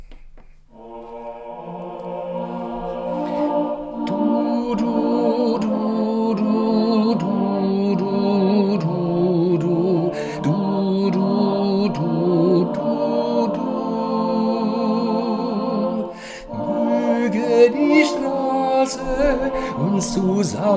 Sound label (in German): Irische Segenswünsche Tenor